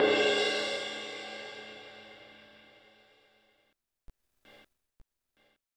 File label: HiHat & Crash, Roland TD-20; HiHats